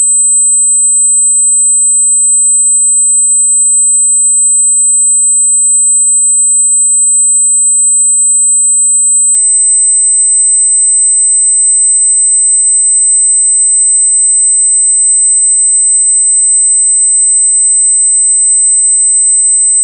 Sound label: sound 36000 hz; alternative